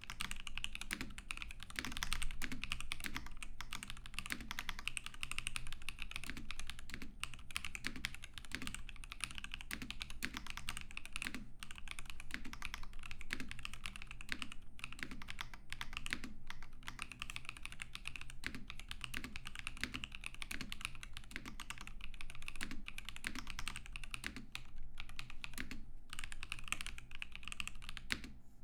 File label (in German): ASUS ROG STRIX MORPH 96 Wireless Soundtest